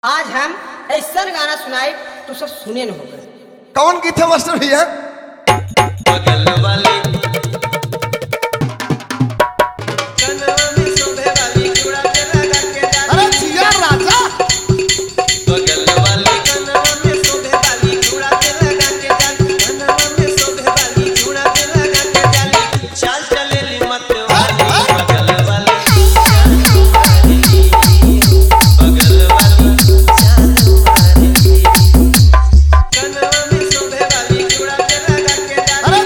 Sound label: Faadu Dhollki Dance Mix